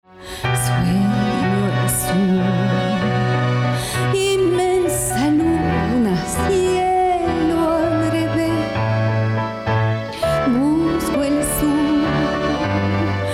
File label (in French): tango récital spectacle milonga chant